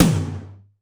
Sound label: Korg 01W MegaDrums; 300 Drum Machines